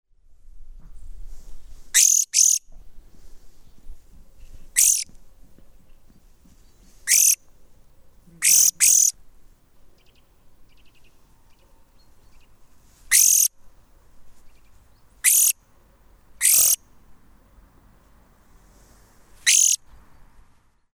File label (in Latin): Chloris chloris; SETE